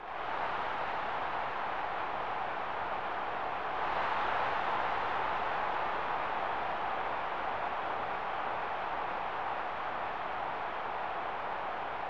Cheers of the Crowd; Tecmo Super Baseball 1994 SNES

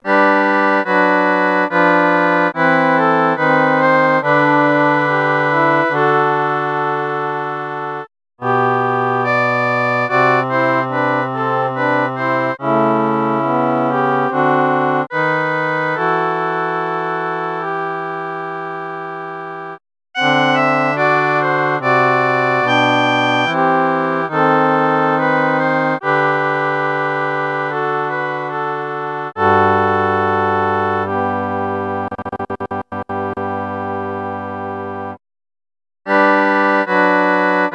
Chorale (Aircheck); animal crossing full gamerip

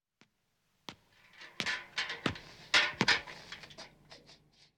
Milkman Approach; The 39 Steps

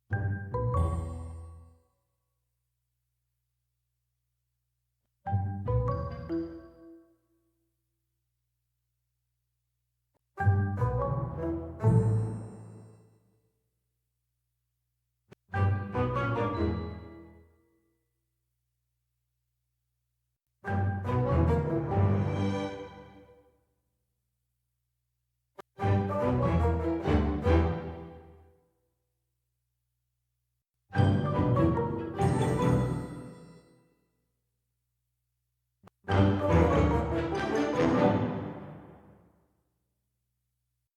Jingles Collection; don t starve together; She Sells Seashells

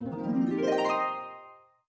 Harp Glissando; House - Harp Glissando 2

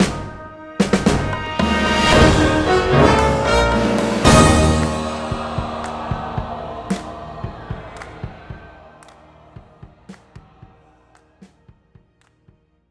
Winpanel Lose

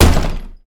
Hit Kick Wood Door

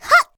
Shout Woman; Inotia 3; Weapon

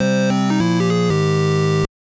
Wrong Answer; Anticipation; NES

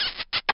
Jingle Valentines

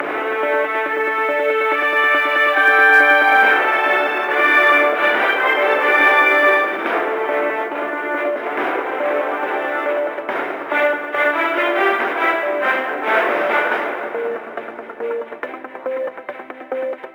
News Jingle Loop; crash drive 2 3 windows gamerip 2015

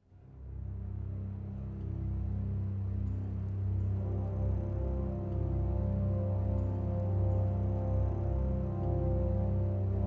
World Music; Jurassic World Evolution 2; PS4 PS5 Windows Xbox One Xbox Series X/S

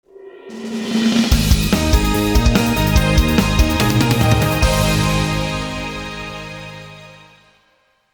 Victory Dance, Level Complete; Giana Sisters Twisted Dreams

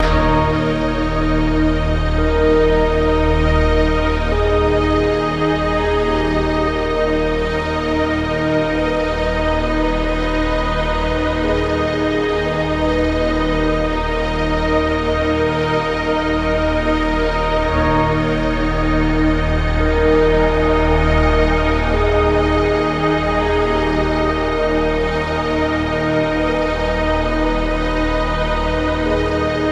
Prespawn Loop

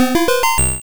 Fly Up; sfx; attack moves; Pokemon; gen 1; rby

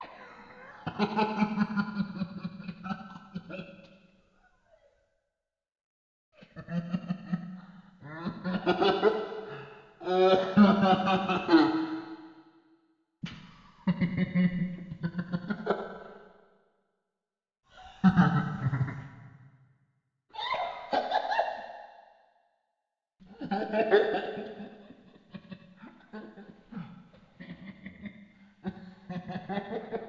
laugh; fredbear

